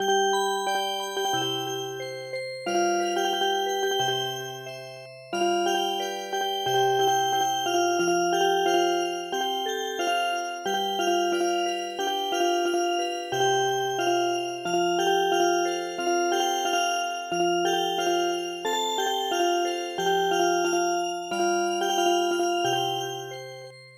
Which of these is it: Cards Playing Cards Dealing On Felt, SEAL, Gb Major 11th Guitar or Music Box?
Music Box